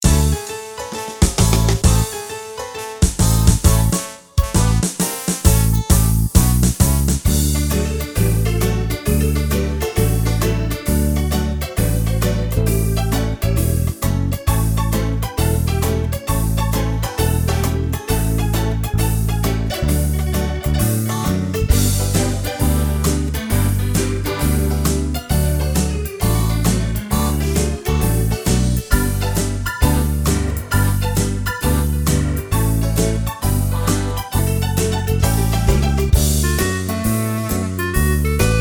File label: Charleston; AjamSonic